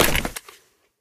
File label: item contract tracker pickup